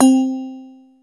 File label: hand bell, Yamaha Motif ES drum machine; 300 Drum Machines; Yamaha Motif ES-Series